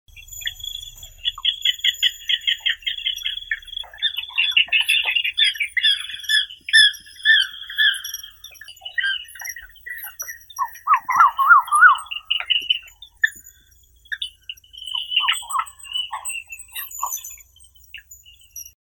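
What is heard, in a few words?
pied harrier, Circus melanoleucos; lun pegii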